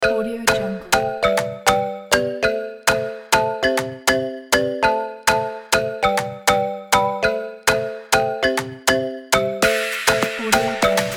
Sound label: tropical summer dancehall upbeat